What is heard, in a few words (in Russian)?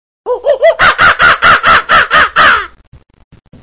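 человек имитирует звук обезьяны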